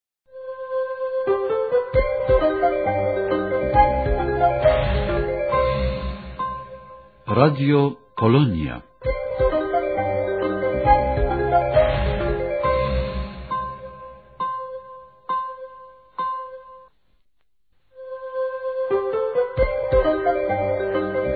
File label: Interval signal; pol z radio polonia